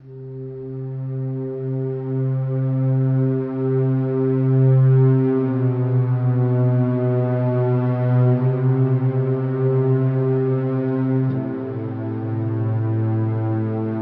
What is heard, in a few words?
ambient, vulcanus; Factorio